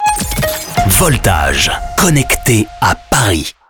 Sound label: jingle